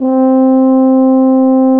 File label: tuba loop note